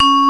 ANALOG COMBO; OMI - Universe of Sounds

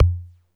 MUTE CONGA, DRUMS; Music Store Sound Service; Einstein

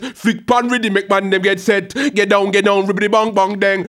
HAL RAGGAM, RAGGA-MUFF A maj

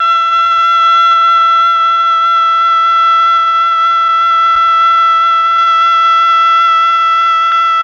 MEL OBOE

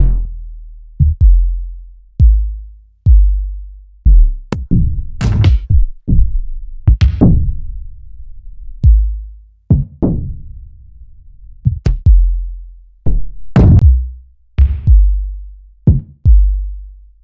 Kicks

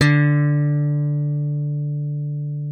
thumb G D3, slap thumb; Xsample VOL-1; 90 s Sample CDs

